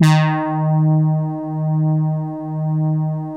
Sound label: BIT BRASS, Vintage Keyboards; Big Fish Audio - First Call Vintage Keyboards